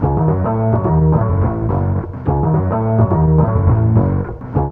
ORG LOP; INSTR LOP; E-Lab; X Static Goldmine 2; akai

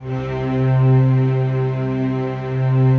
PAD; Best Service; XX Large; Pads

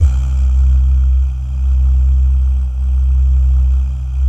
"bah", Vocal Jazz Fx

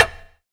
RIM; E-Lab; X Static Goldmine 2; akai

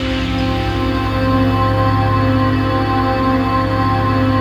ATMOPAD; Best Service; XX Large; Pads

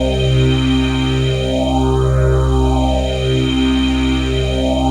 PHASE PAD, ATMO PADS; Best Service; XX Large

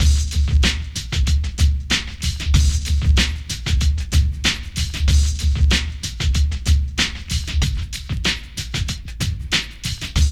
PHAT LOOP; E-Lab; X Static Goldmine 2; akai

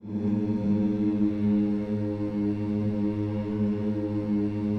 HMMS G#2 D, gospel hmm; Spectrasonics; Vocal Planet Vol.1 Gospel; akai

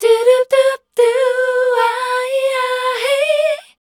MELODIC A, Voice

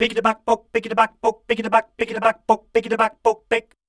scat

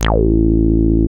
BASS

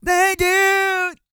GOSPEL

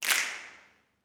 SNAPS, Gospel; Spectrasonics; Vocal Planet Vol.1 Gospel; akai

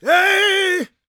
GOSP MALE, Vocal Planet Gospel

